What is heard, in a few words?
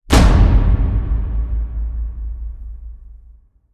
Smashing Hit Epic; Ninja Tracks